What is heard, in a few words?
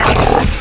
bite, hound; zaero; monsters